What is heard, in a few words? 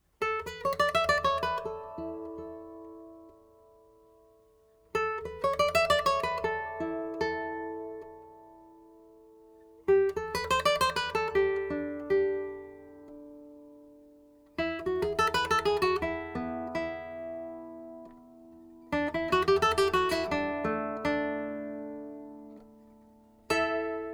Resonance; Conde Conde67